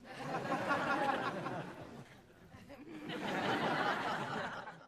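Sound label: Crowd 100 Short Light Burst; medium int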